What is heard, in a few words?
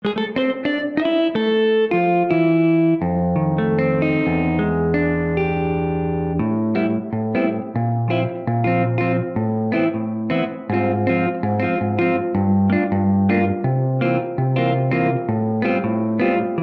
Jazz Guitar - Plate Reverb; OldSkoolVerb